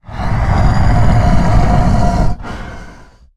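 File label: Stealth Attack Start; Evolve; Goliath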